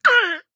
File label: town human road hit; Blind Drive